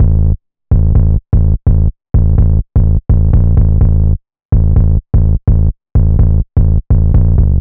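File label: Bass Loop C minor 126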